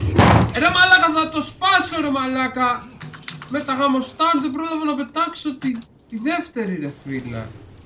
rage

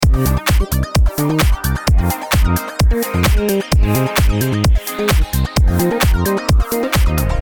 dance intro loop

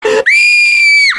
shark puppet scream

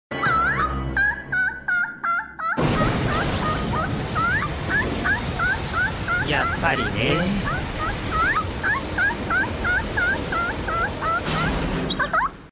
laughter

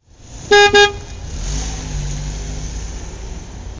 lambo sound

